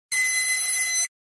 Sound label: Alert Beep Sci Fi